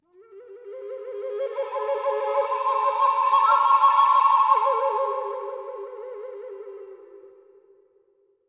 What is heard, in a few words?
Echo Flute; Flutes